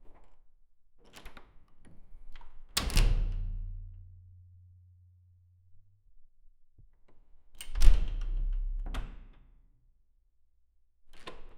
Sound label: the sound of a heavy, doors